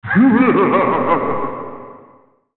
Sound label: Scary laugh